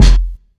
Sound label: short reverb kick one shot f key; kick drum samples